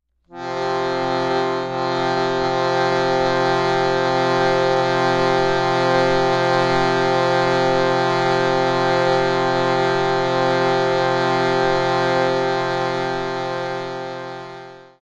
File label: Shruti Drone C F C